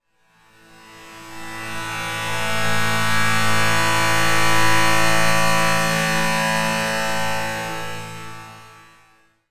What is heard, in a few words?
Bagpipe Chorus